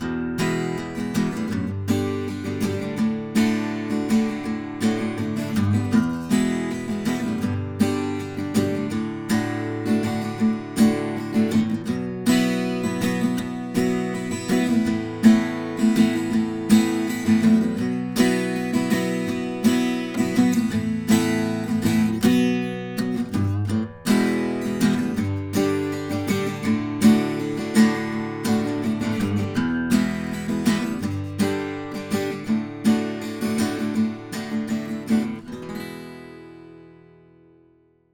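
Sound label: Acoustic Guitar Stereo, KM84 Clone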